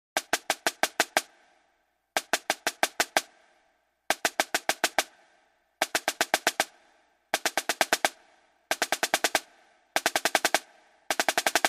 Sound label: single stroke seven